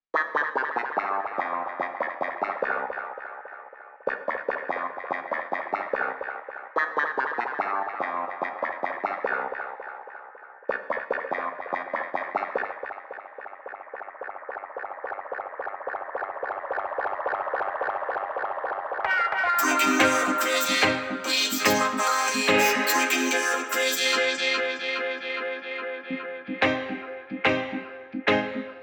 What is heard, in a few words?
Robo Dub Ambient